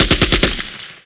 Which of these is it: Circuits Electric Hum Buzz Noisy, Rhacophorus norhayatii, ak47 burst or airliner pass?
ak47 burst